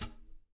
PEDAL HH, HI-HATS; Spectrasonics; Liquid Grooves; LG HI-HATS

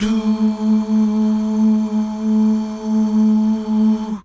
DUUH

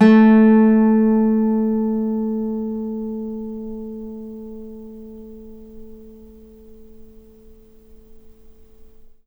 NYLON GTR

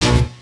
chords

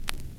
Record Click; Mo' Phatt; Digital Sound Factory Mo' Phatt

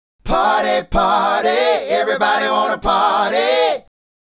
Male Group, Hip-Hop and RnB Vocals

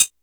metal strike; E-MU Formula 4000 Series Vol. 2; Dance Hits

